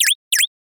finger poke

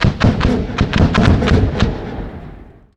continuous far explosions

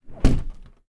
closing bathroom door

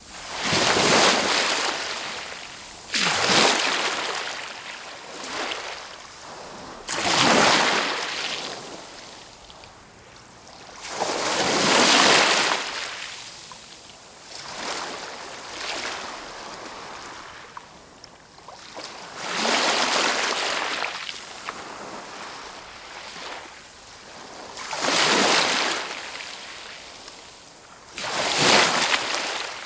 waves breaking on shore

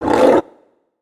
african lion cry

